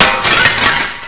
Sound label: glass; quake2